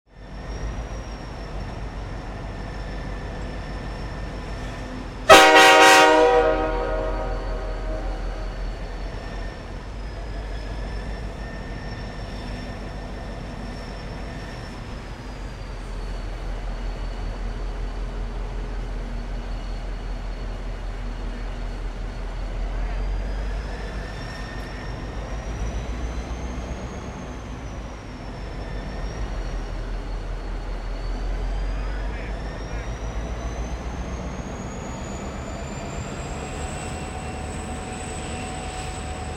Train Exterior Perspective Idle Medium Close Horn Blow; Planes & Trains